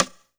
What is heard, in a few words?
Ensoniq SQ-1 Side Stick